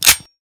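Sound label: AR Malf, weapons; Weapon Parts Overhaul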